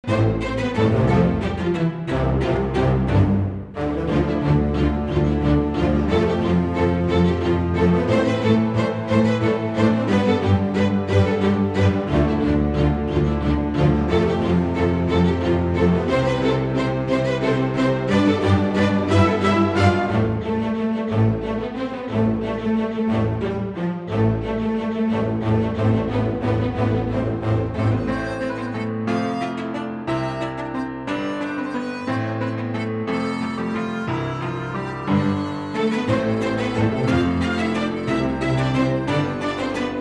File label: battle Loop; pirates